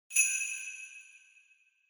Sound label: Sleigh Bells Reverb Shake Gentle